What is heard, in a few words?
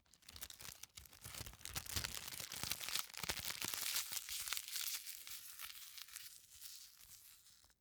package tape wad up